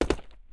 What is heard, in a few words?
jump, footsteps hard surface